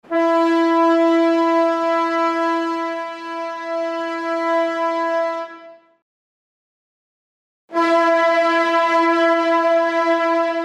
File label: ship horn